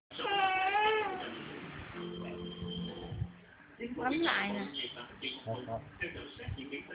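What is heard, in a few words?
baby hungry, female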